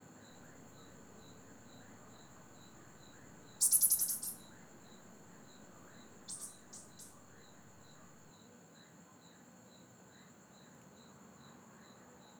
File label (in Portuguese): Animal com som sibilante forte com grilo e sapos na vila a noite; republica; animais; canais stereo